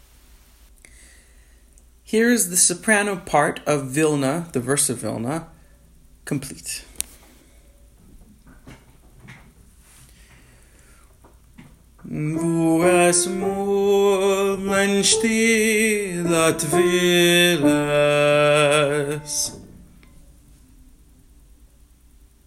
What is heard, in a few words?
Verse Soprano In Sections; Vilne